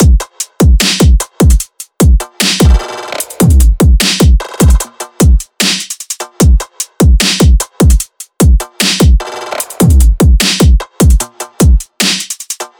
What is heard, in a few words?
drums 150, Full Drum Loops; Slate; SEISMIC Drum Sample Pack